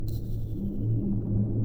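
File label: Music Dpad Evaporate 145; from dust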